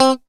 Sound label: PICK C3